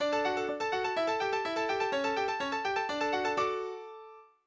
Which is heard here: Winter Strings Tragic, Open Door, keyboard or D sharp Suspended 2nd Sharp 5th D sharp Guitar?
keyboard